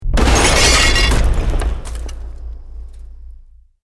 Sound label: EXPLOSION MEDIUM BRICK WOOD GLASS NO FIRE